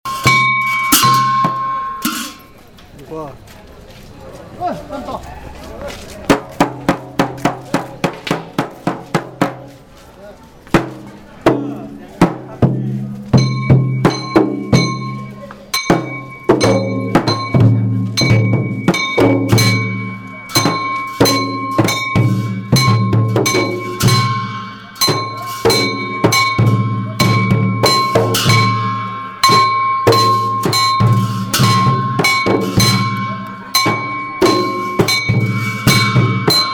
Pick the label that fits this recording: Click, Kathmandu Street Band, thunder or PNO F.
Kathmandu Street Band